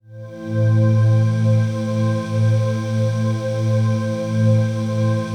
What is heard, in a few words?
TRANCPAD; Best Service; XX Large Pads; Sample CDs